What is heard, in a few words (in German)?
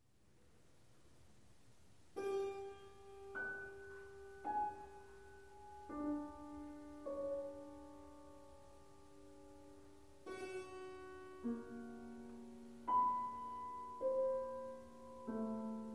Klaviertrio